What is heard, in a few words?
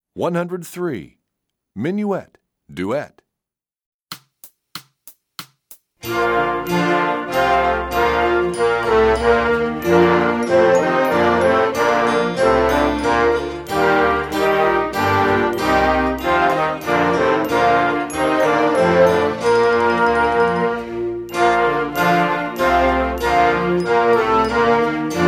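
Minuet Duet, Brass Woodwind; EE2000 Book1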